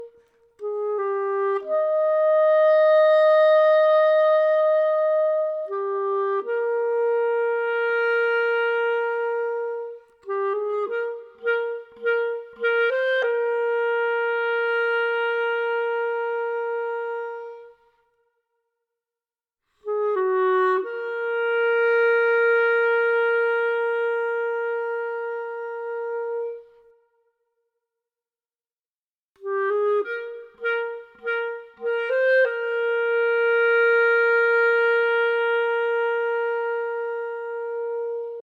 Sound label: duet clarinet loop 100bpm EbMajor; chicoryclarinet